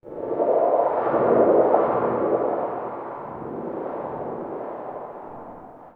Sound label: flyby, Drum N Bass; Sonic Foundry (Sony Creative Software); Knutrix Drum & Bass